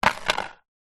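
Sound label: leisure frisbee plastic land wood surface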